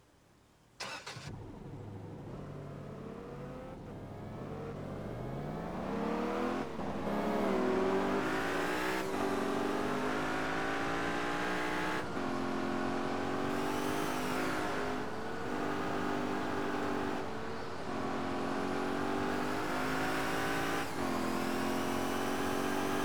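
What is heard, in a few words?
mercedes-benz slk 55 amg, car; TDU-1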